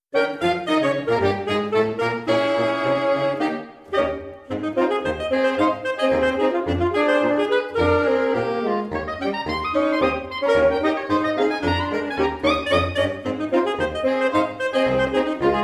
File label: sax o doodle excerpt